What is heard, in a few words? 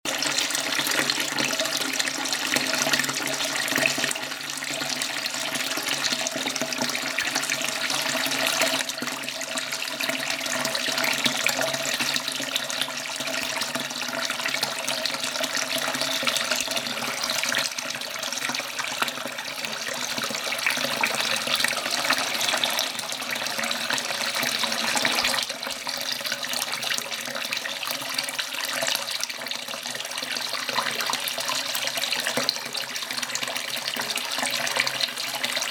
High speed stream, Voice of Nature